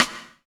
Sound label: Rock Stick, Rimshots; Roland BK-5